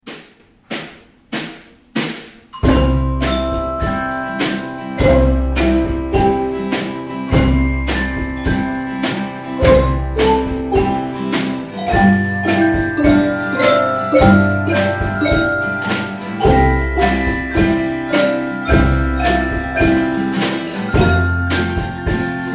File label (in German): weihnachtsglocken